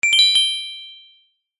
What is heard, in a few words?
multimedia game sound gem treasure found